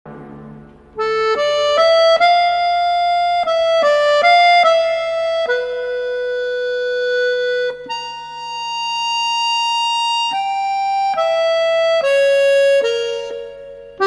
Horn Walz

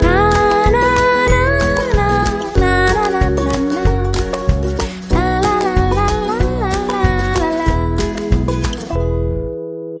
Intro Vocals

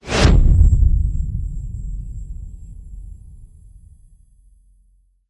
Hit Bolt Deafening Blow

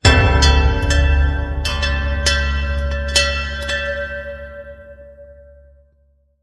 Bells Ringing

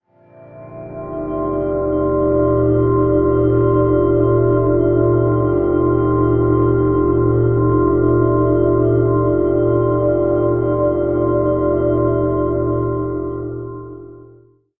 Music Pad

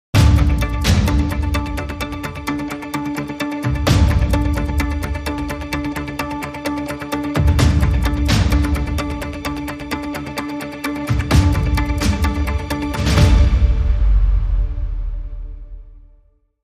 Clocked Strings, Bumper

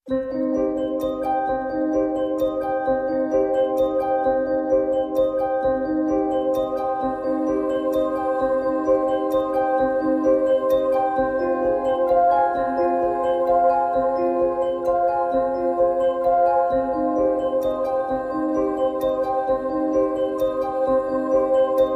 Handbells C

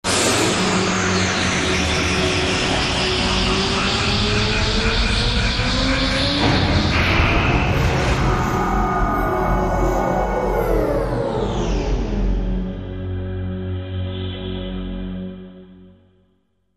Space Launch

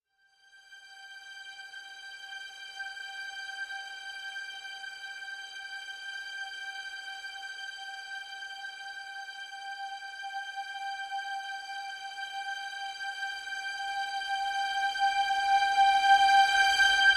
Simple String (G)

